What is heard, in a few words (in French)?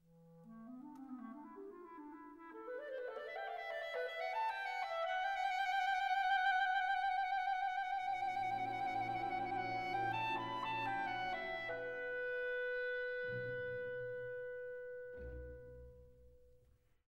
clarinette tarogato